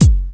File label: dry kick one shot f sharp key; kick drum samples